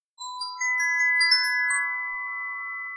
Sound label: denoising glock 0dB mix